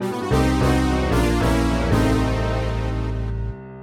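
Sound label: Jingle Lose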